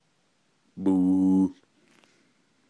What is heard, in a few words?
boooo